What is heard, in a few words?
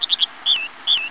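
dipper song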